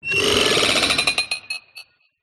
TONAL ACHIEVEMENT POWERUP EVENT; PMSFX

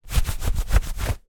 foley itch scratch denim jeans

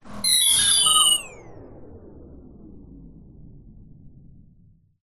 science fiction robot failure glitch shutdown

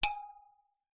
impacts metal ping small; zapsplat

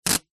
office sellotape rip

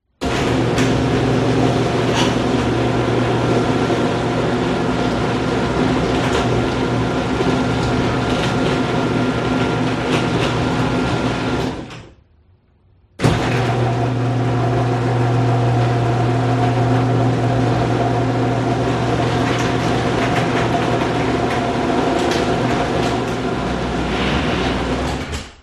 household garage door electric open close

